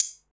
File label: HH, Drum & Bass, 160 BPM